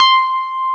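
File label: C4 electric piano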